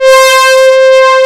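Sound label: MEMOOG BRASS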